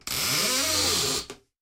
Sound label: Shower Door Creak; beanstalk audio